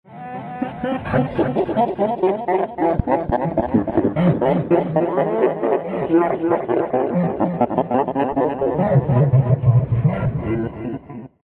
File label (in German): lachen; monstergeraeusche auf der play19; Hamburger Markthalle